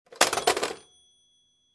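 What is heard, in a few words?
sound of a phone falling on the floor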